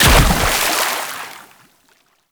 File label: Tenno Land Heavy Water, footsteps; Warframe